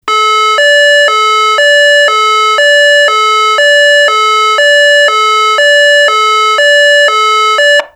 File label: two tone, siren; sa441M